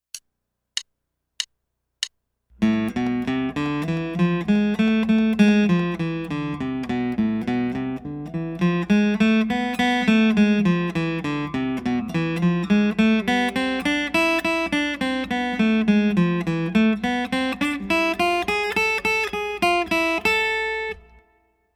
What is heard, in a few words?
A Daily Scale Study In Am